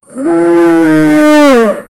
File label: a bear growling when atta